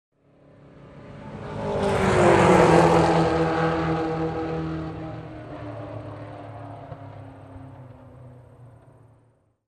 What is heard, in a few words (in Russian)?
Проезд спортивного автомобиля мимо слева на право